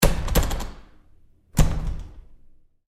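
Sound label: Door Bathroom Stall Handling close-up Rough Short; Big Room Sound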